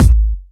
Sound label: steel kick drum a key, Royality free kick drum sample tuned to the A note. Loudest frequency: 401Hz; kick drum samples